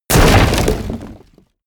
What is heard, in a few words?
break wood medium